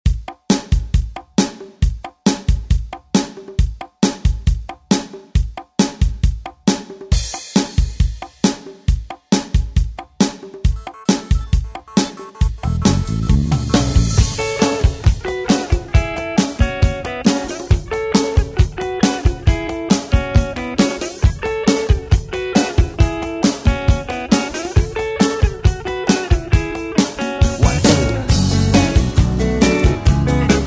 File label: 90s ReDrum; DJ Mhark